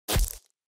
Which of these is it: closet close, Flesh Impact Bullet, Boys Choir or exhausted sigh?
Flesh Impact Bullet